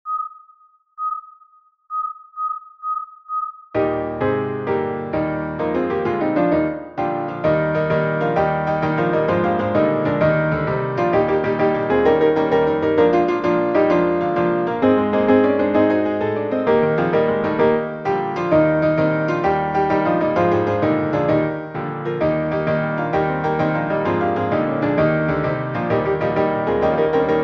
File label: ramblinband piano midi